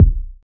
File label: dope urban kick drum single hit C key